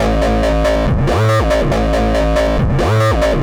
140 A BASSLINE, Dubstep; Loopmasters; The New Sound Of Dubstep; BASS LOOPS

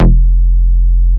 Bass Shot F; Mutekki Media; Vengeance Electroshock Vol.2; Bass Shots

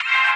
C STAB; Loopmasters; Funky Underground Vol 2

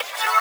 F# VOX STAB; Loopmasters; Funky Underground Vol 2

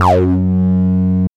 303 Gb2; Jack Sparrow; Deep Dubstep Progressions

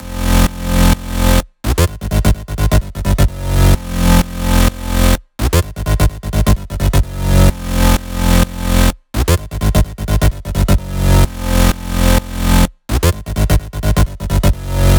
Electro House Melody; Mutekki Media; Vengeance Electroshock Vol.2

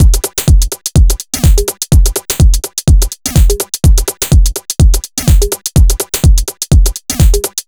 125 bpm, Digital Breaks; Loopmasters; Jon Carter & Alex Blanco; Block Party Essentials

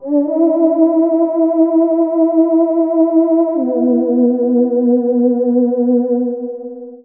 Bpm136 Theremin D, Dubstep; Mesmerise

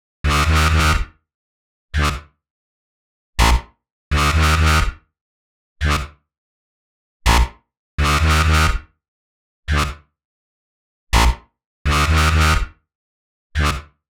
124 G Bass, Complex Electro Synths; Prime Loops; Elements

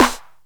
Claps & Snares

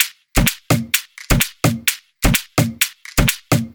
Dirty Dutch Loop; Mutekki Media; Vengeance Electroshock Vol.2

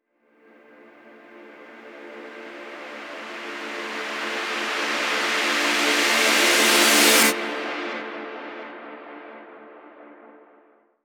FX Uplifter

